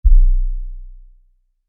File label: Lfe Hit; Hits; Sound Design; Production Element